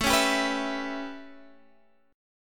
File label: A 9th